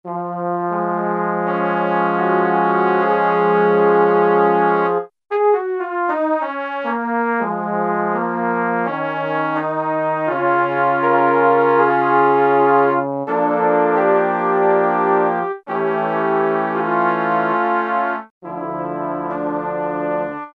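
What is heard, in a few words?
Brass Flugel Section; Brass And Woodwinds; Roland L-CDX-03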